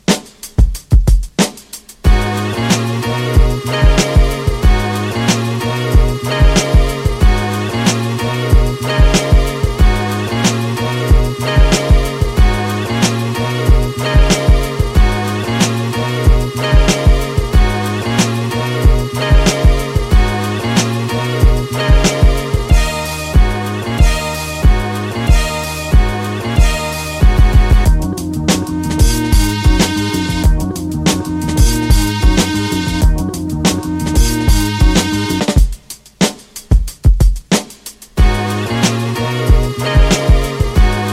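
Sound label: hip hop beat breaks instrumental; hip hop 50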